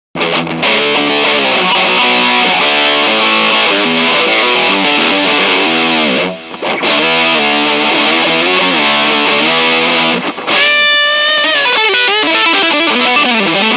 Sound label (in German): Steg HB